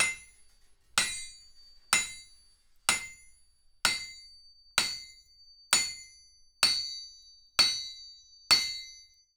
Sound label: Hitting Anvil